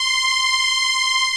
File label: MIXED CHORUS